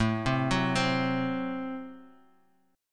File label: A Minor 6th A Guitar Half Step Down